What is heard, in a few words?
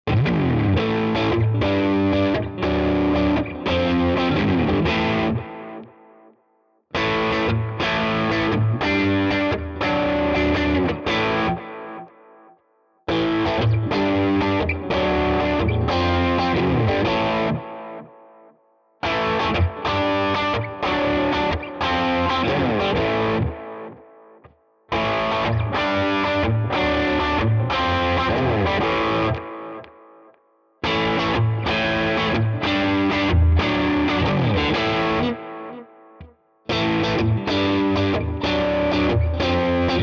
Ibanez AZ SDB mid gain Riff all positions